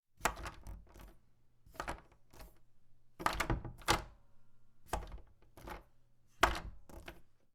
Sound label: turning door knob